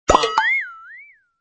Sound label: emotion question; wanNingTaiQiu